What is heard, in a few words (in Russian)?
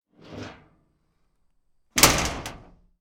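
металлическая дверь закрывается с дребезжанием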